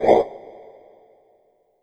DRM SHK Flanger; Polyend; Plughugger

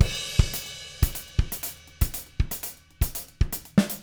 120, Living Drums, CARIBE; Sampleheads; Peter Erskine Living Drums

